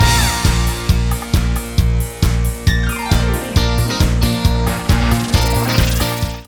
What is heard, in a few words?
karaoke time; twitch channel redemptions